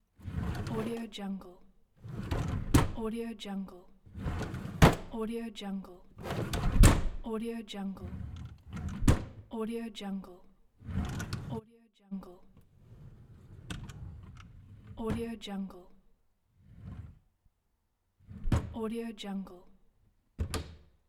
drawers opening

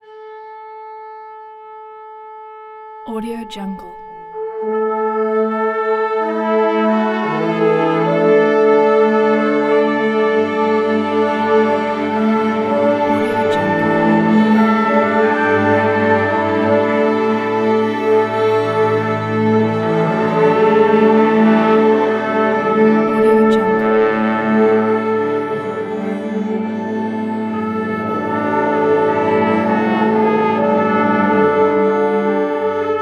orchestra tune; blast drive 30; prosoundfx

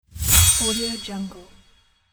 magical spell

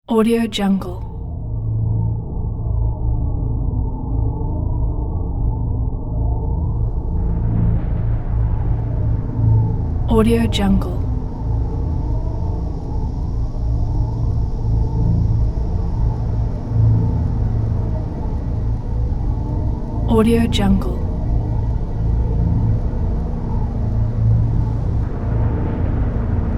eerie hollow drone with weather accents; sound ideas

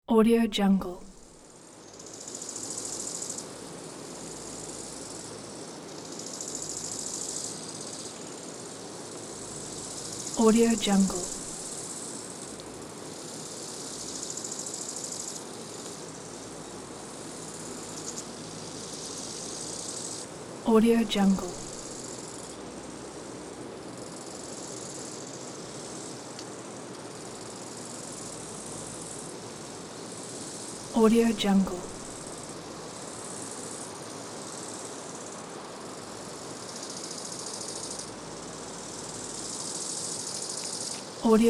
summer day ambience

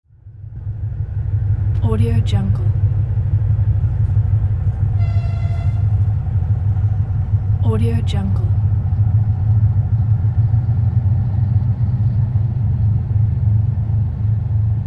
train engine

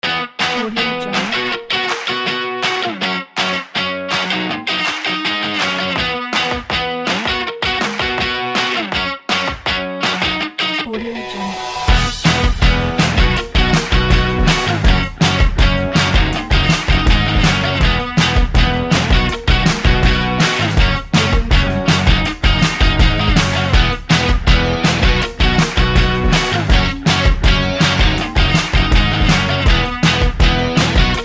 positive upbeat

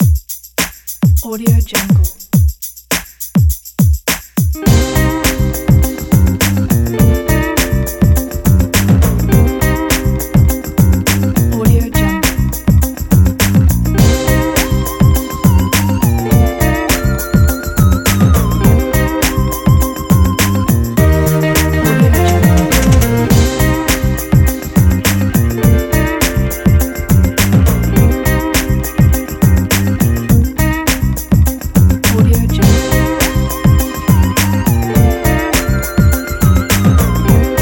funky detective

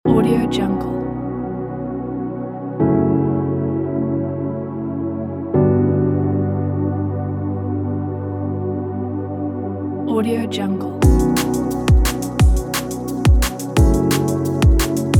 the drum bass

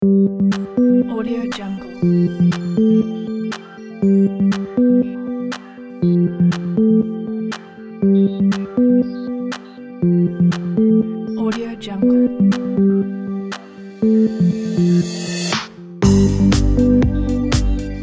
minimal uplifting background

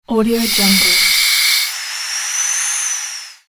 hospital breathing mask breath

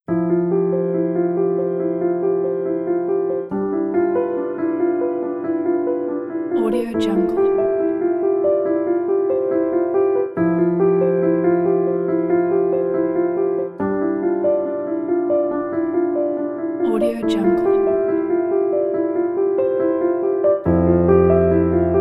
soaring piano; animapiano

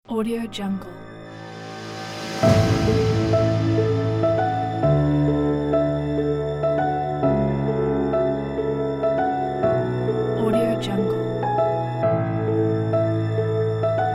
cinematic inspiring soundscape

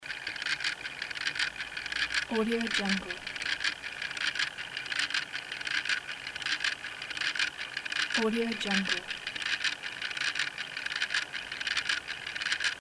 small rotating mechanism

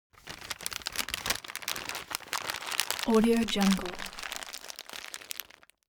object paper wrapping crumple

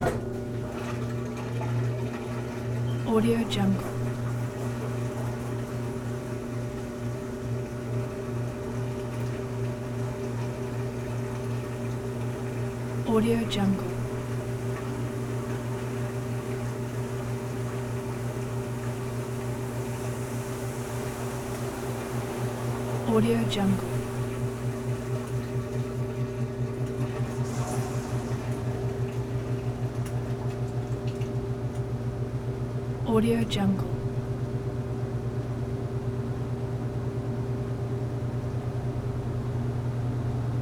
washing machine

